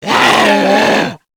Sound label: kid zombie alert; nmrih; childrenofthedead; zerosiki; slender